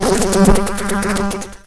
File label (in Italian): moscone; animalandia